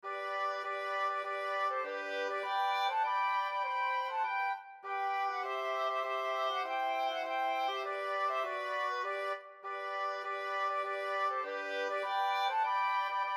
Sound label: BATTLE HYMN